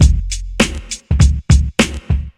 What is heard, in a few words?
101 bpm breakbeat c sharp key